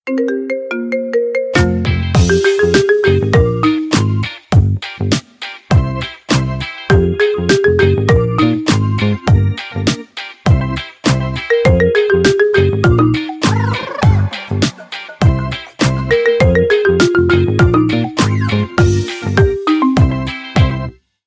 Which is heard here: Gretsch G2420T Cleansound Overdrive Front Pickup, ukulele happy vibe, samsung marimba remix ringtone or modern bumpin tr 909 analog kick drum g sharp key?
samsung marimba remix ringtone